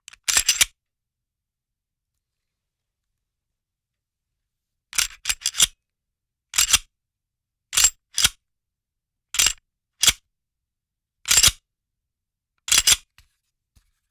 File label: handgun slide handling